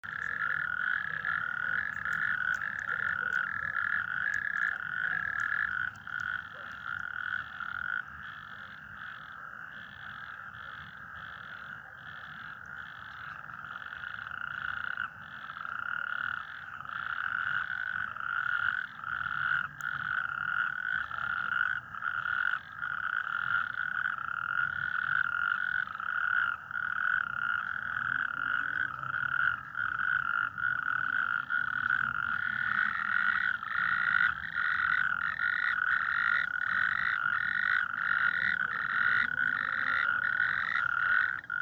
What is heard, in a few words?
natterjack toad epidalea calamita; lyagushek i zhab